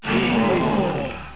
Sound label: hum; mortal kombat